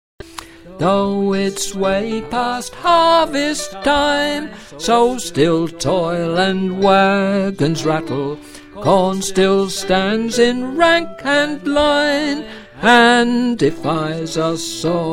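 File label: soprano, singers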